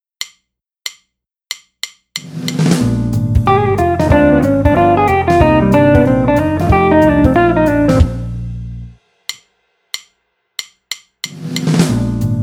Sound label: Build Up